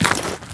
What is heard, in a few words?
player dirt